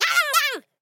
Otter voice

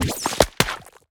Otter taunt

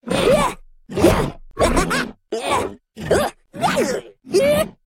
Big Attack Pre Voice

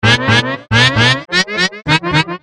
Hurt Voice

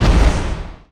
Flare Gun Hit

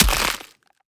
Background Otter eat

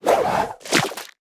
Background Otter sleep

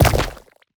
Otter death impact

